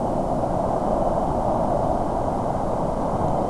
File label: A-10 wind; A-10 Attack! DEMO